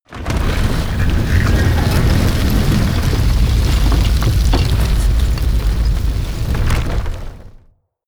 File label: gate stone large open